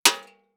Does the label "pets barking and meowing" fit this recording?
No